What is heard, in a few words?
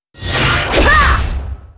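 monster attack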